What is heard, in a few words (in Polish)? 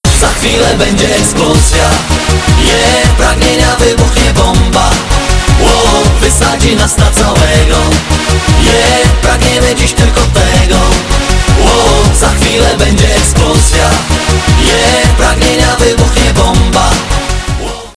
explozja